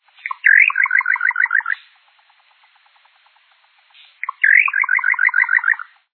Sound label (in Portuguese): bacurau-pequeno